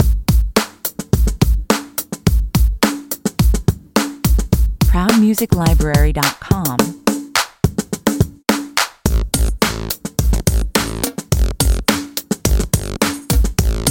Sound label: (drums and bass) 106 bpm